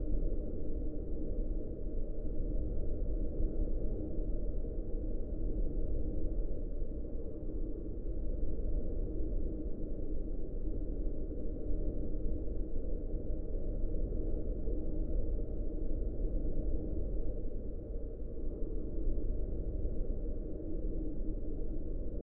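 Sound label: ambience castle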